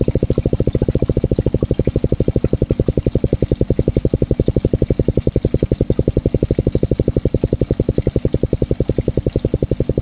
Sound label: Melanogrammus aeglefinus knock wild